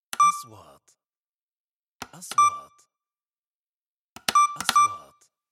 نغمة كاشير, transitions

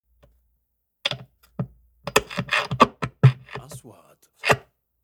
خلع مسمار; sound effect

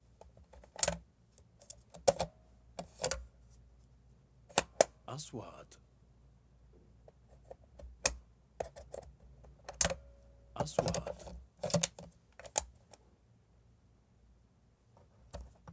صوت تركيب عدسات التصوير; shooting

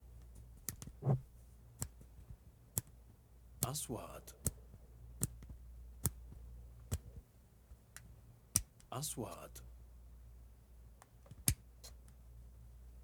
صوت عجلة الاعدادات الكاميرة; sound effect; shooting

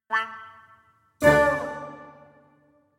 إنتقالة كوميدية

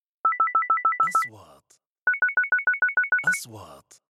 نغمة نقره الكترونية, transitions

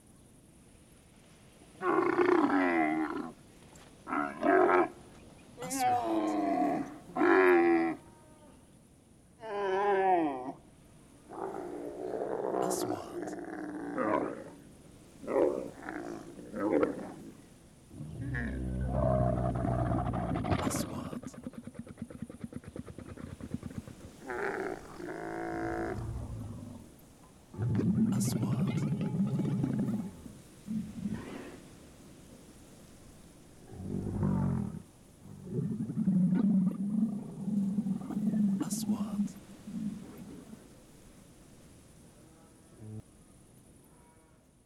صوت جمل; sound effect; رمال

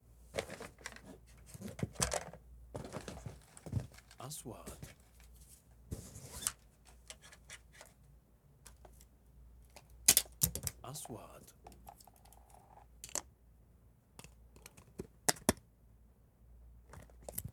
صوت حقيبة معدات التصوير; sound effect; shooting

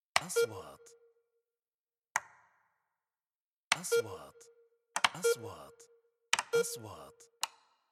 نغمة تنبيه خطأ; transitions

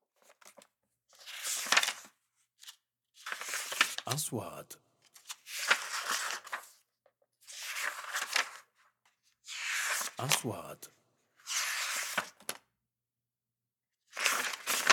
كتاب دفتر صفحات

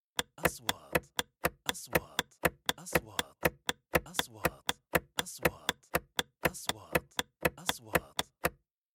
نغمة إشارة سيارة; transitions

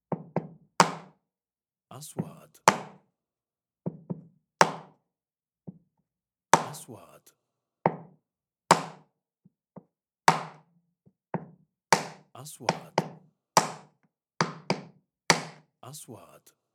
صوت تقطيع لحوم; sound effect

